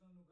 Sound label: Piano B